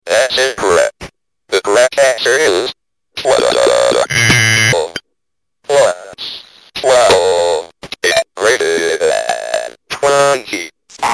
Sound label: advance; loopadvance